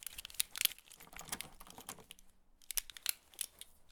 multitool use loop; Escape from Tarkov

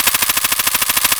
m4 bolt loop; Escape from Tarkov

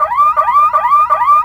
car alarm loop

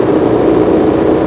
turret mgun loop echo

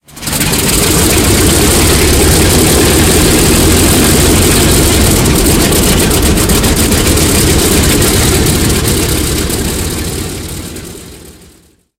engine c46 start; War Thunder; engines

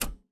Aircrafts Cockpits Switch; DCS

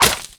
plank small

